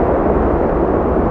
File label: wind bea; beach1